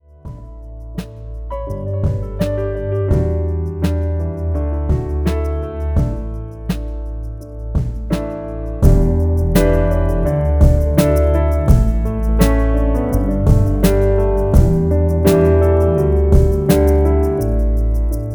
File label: Piano Track Unmastered Mastered